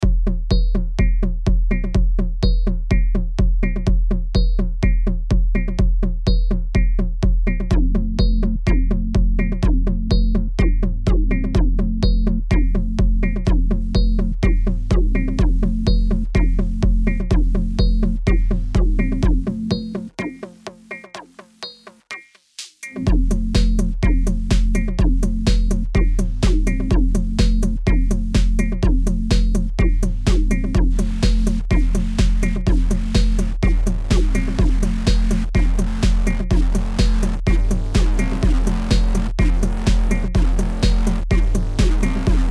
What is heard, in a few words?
Distortion